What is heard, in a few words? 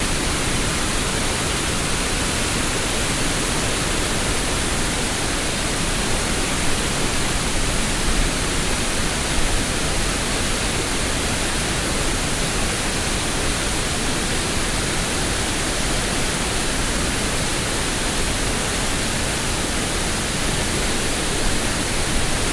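Waterfall Large Medium Close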